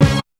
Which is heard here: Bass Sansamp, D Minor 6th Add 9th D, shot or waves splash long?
shot